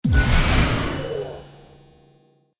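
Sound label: Whoosh Teleport Exit Synth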